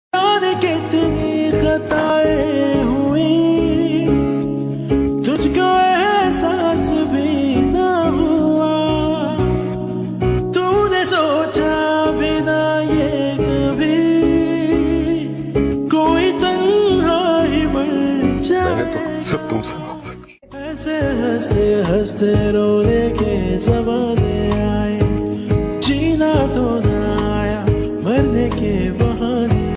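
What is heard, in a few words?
original soundtrack pakistani ringtone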